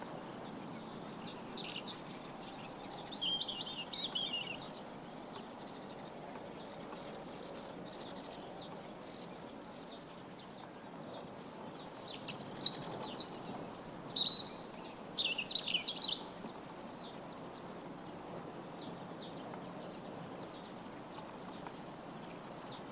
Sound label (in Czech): AMBIENT DVOREK; pat & mat game